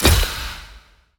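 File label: atk ice sword staff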